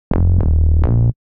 Bass F Minor 83 bpm; MIDI Clips; Bassline; Phatskweek; Ableton; Factory Packs; Chop and Swing

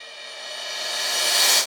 143 Garage Reverse Cymbal; Dance DJ

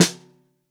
SN Bottom, Snare

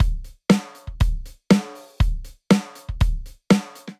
Rock Kit; Drum Kits; Ableton; Drum Booth

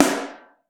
SN3 Mono, Snare

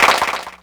Clapbox Pitch11; Ableton Foundation FreePacks

